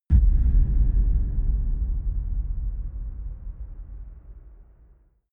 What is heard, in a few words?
dark outer space shadow, hits impacts